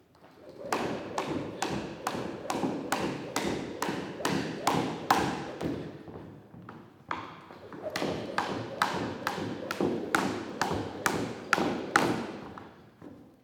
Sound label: Boxing Gym Jump Rope; Craig Carter Collection